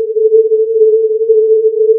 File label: gnoise 440